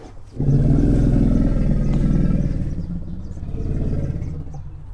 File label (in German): Elefantebulle rumble